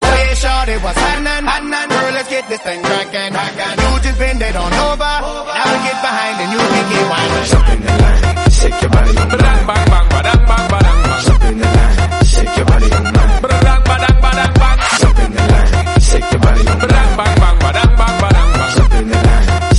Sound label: pop hit